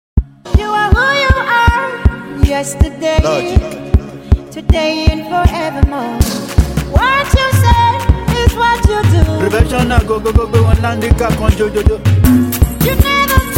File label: Professional beat mara beat